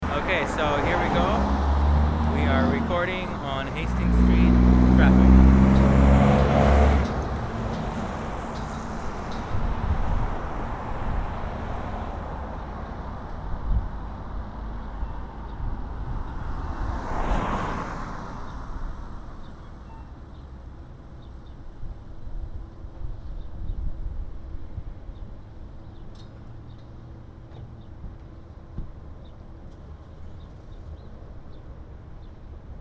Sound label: Traffic Ambience Binaural Sony Internal Mics